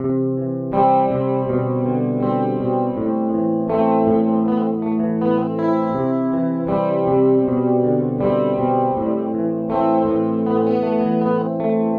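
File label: tetraplopia global max, doubler; Four-voice stereo doubler.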